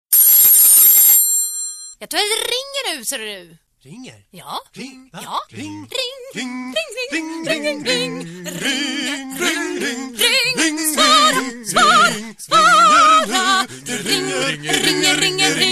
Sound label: Ring song